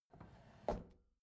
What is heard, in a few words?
bathroom drawer open soft